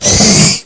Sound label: pain, alien hurt